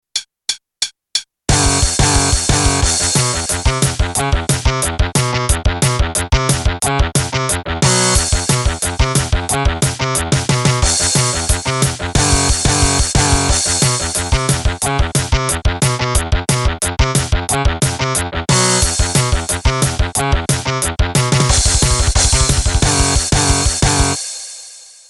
uebung, bass heavy corner

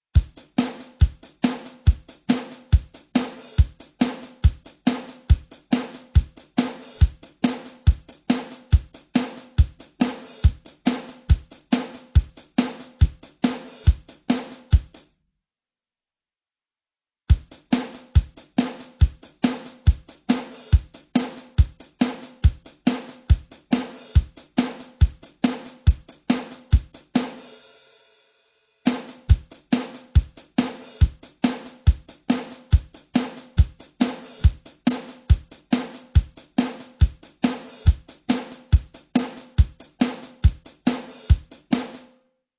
pulstraining gerade achtel 140

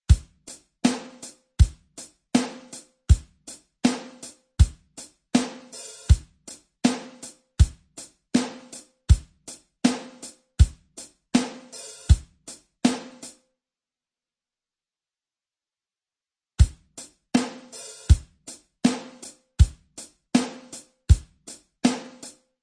pulstraining gerade Achtel 80

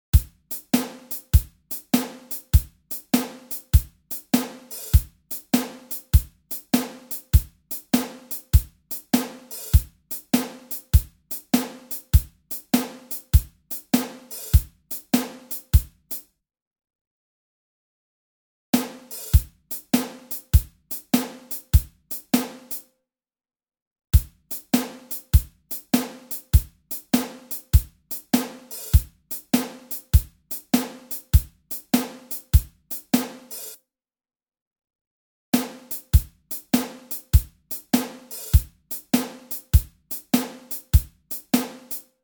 pulstraining shuffle 100

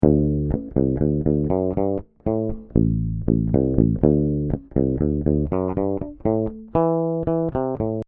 steg pickup finger, bass; hoefner

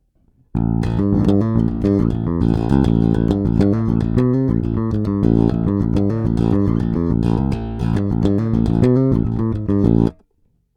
pu seriell

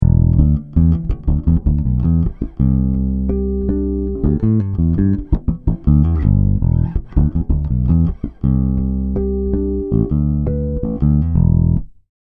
ohne elixir; elixir cable

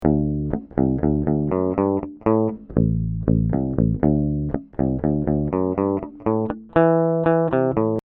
treble on plektrum, club bass; hoefner

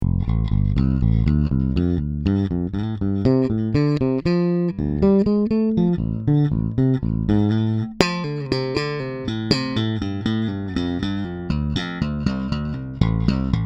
steg mittel hals pu; ibanez jetking jtkb300

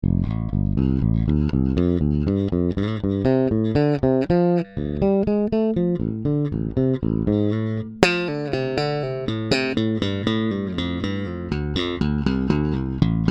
steg mittel pu; ibanez jetking jtkb300

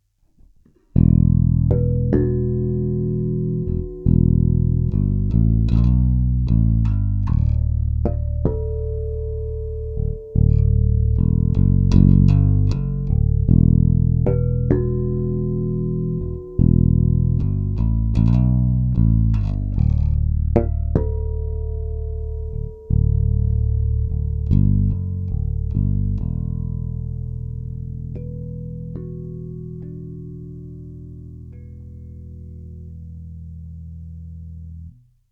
nature shock fusion5 akt both bass hoehen leicht geboostet

